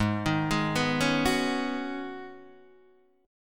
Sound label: Ab Minor 11th, Ab, Guitar, DADGAD